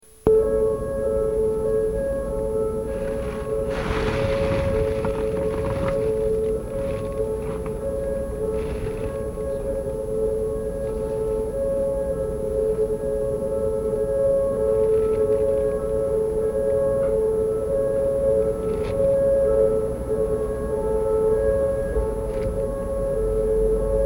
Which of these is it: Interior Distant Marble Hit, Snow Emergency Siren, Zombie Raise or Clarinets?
Snow Emergency Siren